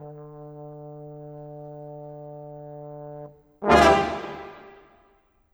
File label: Brass, Orchestral, Rock Pop